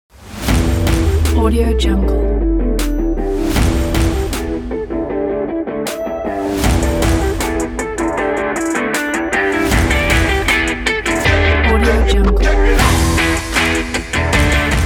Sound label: upbeat stomp rock